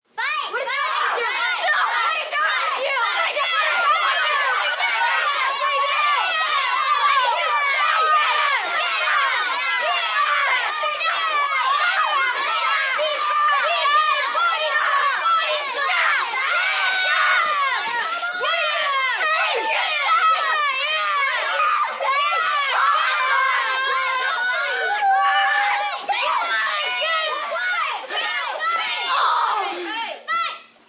Group Fight, Fight, large group of children yelling,& screaming